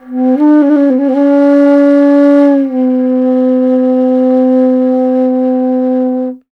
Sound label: INDIA BASS, IND BASS